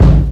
TOM, TOMS KIT, Ragga & Dub Reggae

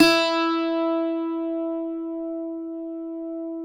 cuatro E; Spectrasonics; Hans Zimmer Guitars VOL-2

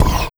Sweep Hit; Sonic Foundry (Sony Creative Software); Esoterik Beatz; Sweep Kit

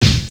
Kick, Drum One Shots; AMG - Coldcut's Kleptomania VOL-1

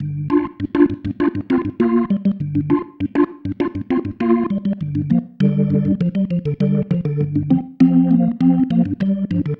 Pattern, Organ

